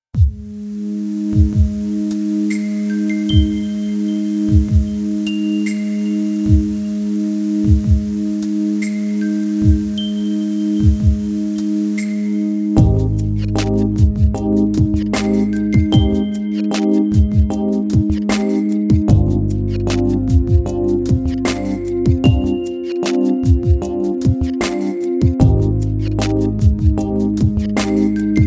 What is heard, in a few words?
rain main vocal off